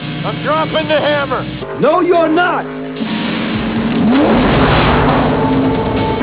hammer

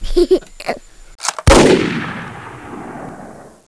gun

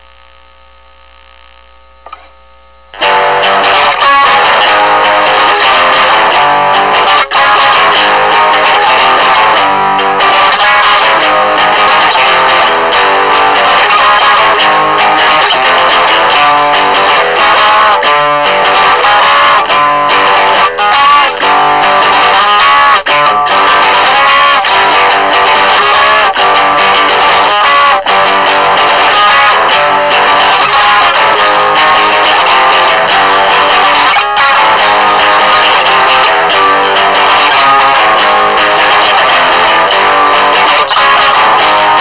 inside out scratch

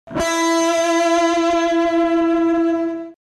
vibrato

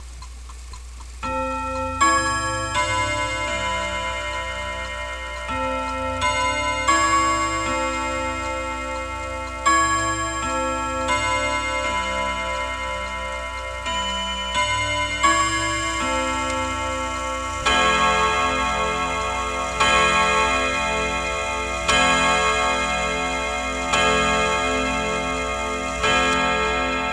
Clocker

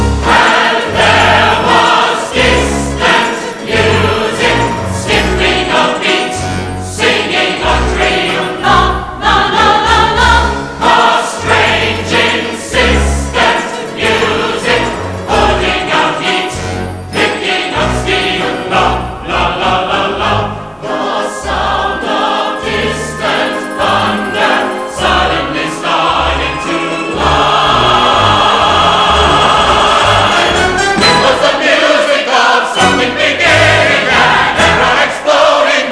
ragtime

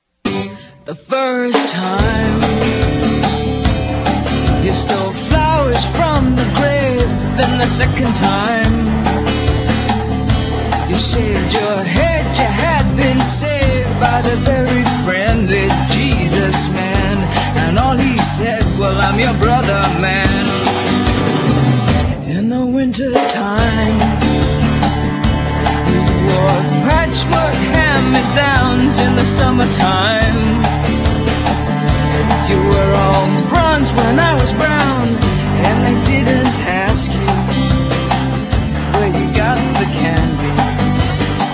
yodel

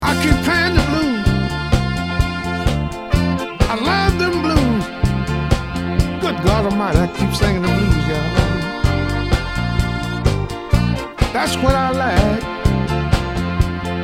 blues

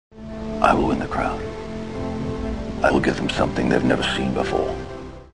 win crowd

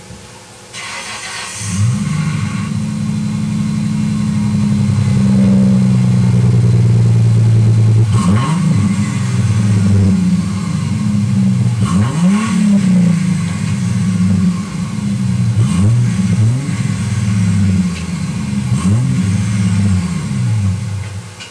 stang

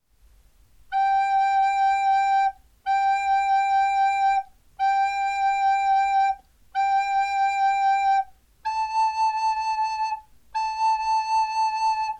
Blues Melody

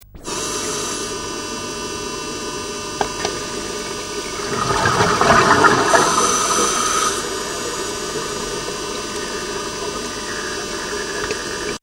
Deep Noise

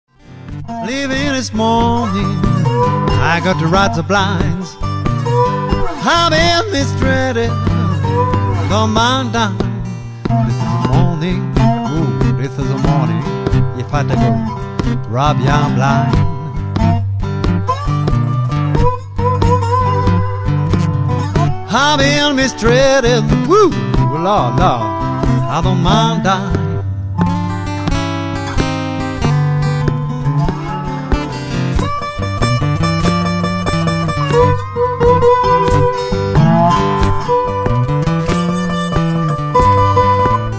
walkin blues

